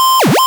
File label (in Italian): suoneria botton; beep